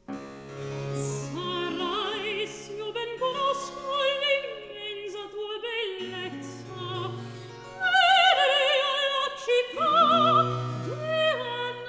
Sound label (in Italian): Recitativo; Sarai s'io ben conosco; Baroque; Alessandro Scarlatti; Duet Cantatas